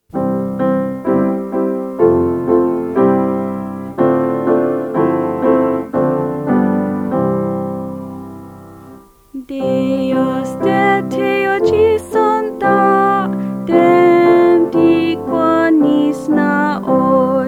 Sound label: Twinkle Twinkle Little Star